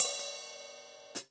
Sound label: Hats Open, drum